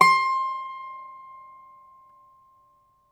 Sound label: IRISH, Old World Instruments; E-MU Producer Series Vol. 7 – Old World Instruments; World Combos; Ireland